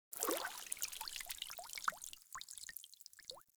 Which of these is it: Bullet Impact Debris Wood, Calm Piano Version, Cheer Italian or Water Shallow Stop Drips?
Water Shallow Stop Drips